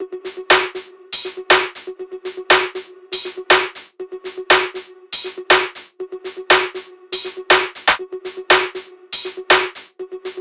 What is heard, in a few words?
tops 120, Drum Sample Pack Loops Top Loops; SD SEISMIC; Slate